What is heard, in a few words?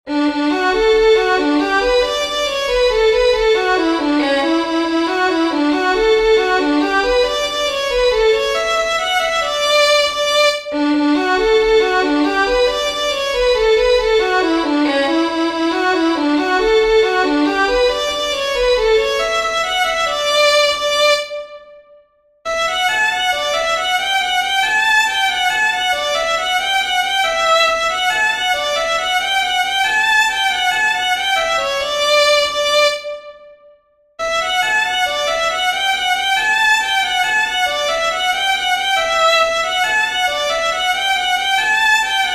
JIG, fiddlers; PINETTE RIVER; Southern Kings Fiddlers; peifiddlers